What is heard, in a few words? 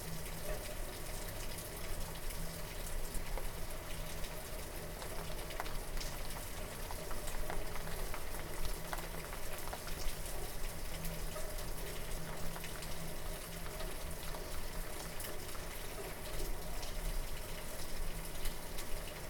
rain, raining over blinds, inside recording; ambience; NS Studios - ultra sound collection